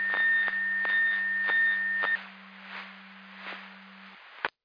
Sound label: Siren; Hamburg FD